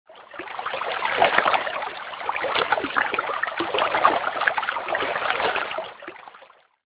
Shore; Nokia; 5140

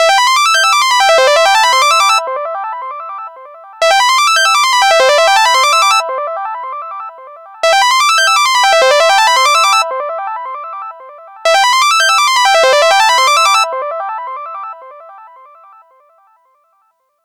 Beep Dreamy, ringtone; SKY IM-A830S-VEGA-Racer-2

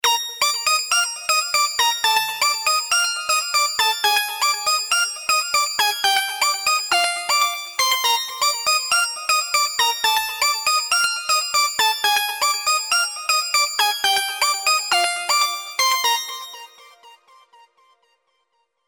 Rays Alarm, Synth; HTC

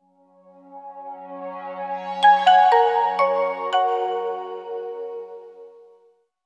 Tune startup; Nokia; 8310 startup; NokiaTuneEndModel; MTS (Ian Livingstone)

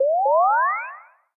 Glide, notifications; ZTE Grand-S-2

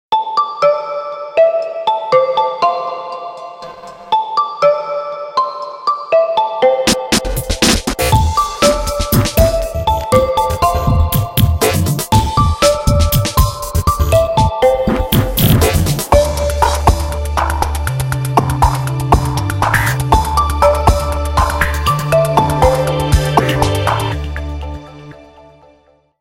Drip Groove, Nokia Tones; Windows Phone apps

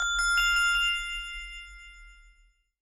Notify Ring; Okapia Air

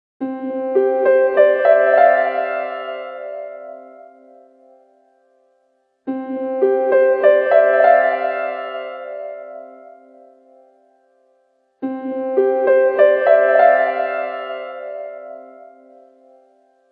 alert piano; Nokia; 8800 Sirocco Edition

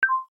Network off; Audio themes

